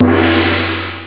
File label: gong; ST-XX Amiga Soundtracker Sample Packs